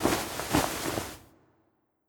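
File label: Skeleton Scientist Clothes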